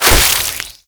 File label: Boomerang Fast Whoosh; Infested